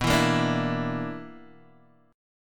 B Minor Major 7th Double Flat 5th B, Guitar, Open D